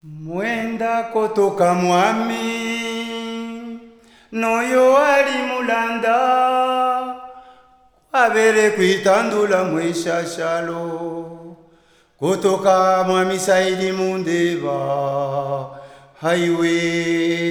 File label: Chant Yeke; Mwenda Kotoka Mwami